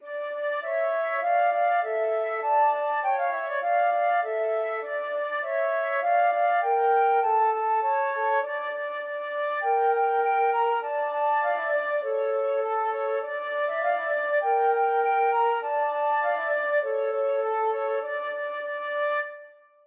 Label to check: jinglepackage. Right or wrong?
Wrong